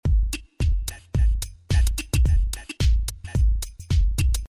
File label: Dooie Bdat 109